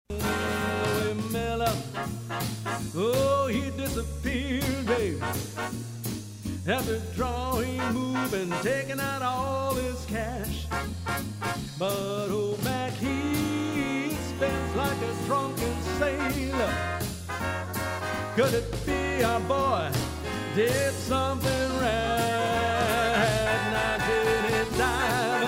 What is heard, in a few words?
swing, big band